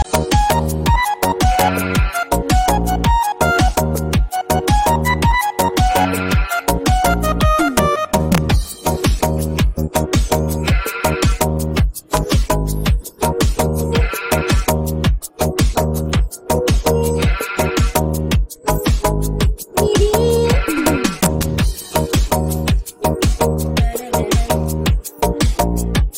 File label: instrumental